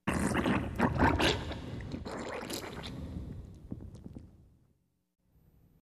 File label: Alien Drips Large Rev, slime